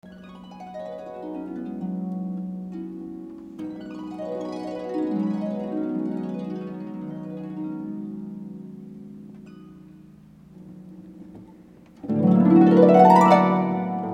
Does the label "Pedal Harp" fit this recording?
Yes